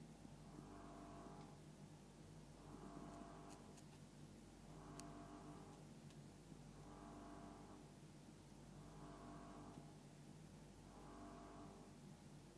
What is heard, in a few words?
building sounds pulsing aircon at work